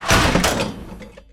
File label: small caliber shoot mechs